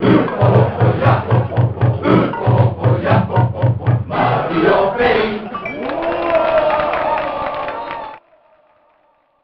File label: Fan Chant; mario paint game rip